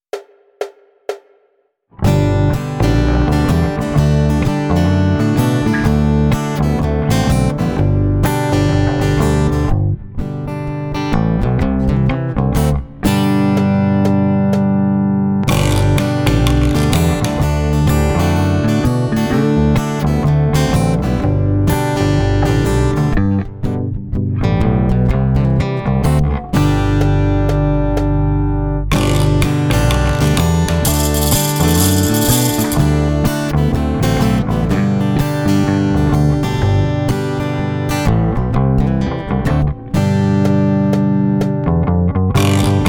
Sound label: instrumental